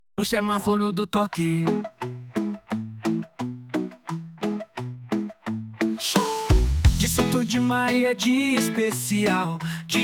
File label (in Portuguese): Musica Infantil